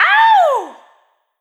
short scream, vocal; Sonic Foundry (Sony Creative Software) - Pocket Diva World Class Vocal Samples; Words

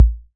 Kick C, Korg KR-55B

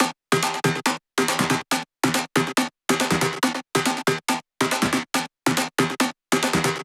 140BPM C; Vengeance - Essential Clubsounds Vol.4

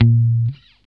GUI A#2, E-GUI MUTED; Sound & Vision; Gigapack I CD 2

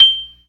PERC, ORGAN; Keith Emerson Signature Series

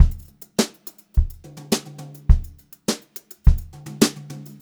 Brushed Drum Loops; USB Soundscan vol.39; AKAI

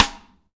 SNARES DRY; Real Mega Drums

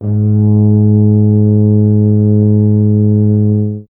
BRS TUBA, Solo Instruments; Miroslav Vitous; Solo Instruments VOL-2

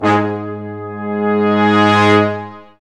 BRS, Lrg Ensemble; Roland L-CD702

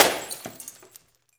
FX BOTTL, INDUSTRIAL; Roland - LA Composer vol. 1

